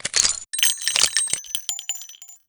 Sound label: clip out